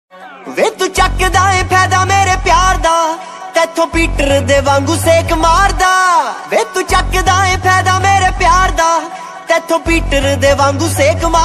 new punjabi ringtone new punjabi love ringtone new punjabi sad song ringtone